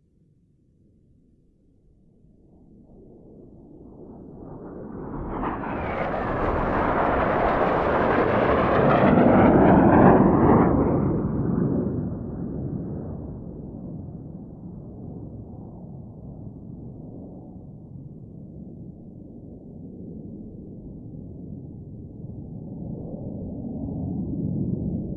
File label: aircraft f 5 by series of 3 high formation flyby slow active rumbling drone of jets